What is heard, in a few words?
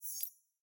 grid click release; clash